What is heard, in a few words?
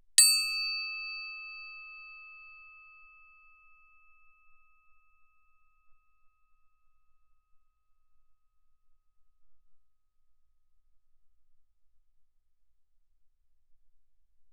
crotale Eb6 ff; Percussion; crotales